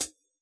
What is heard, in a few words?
hi-hat pedal, Yamaha DJX Rock Kit